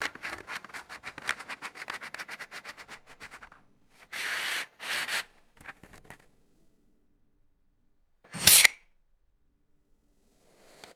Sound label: matchbox handling and match strike; fire